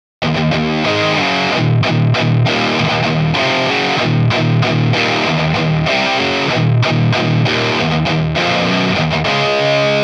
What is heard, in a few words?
Lead Riff; Mercury2CP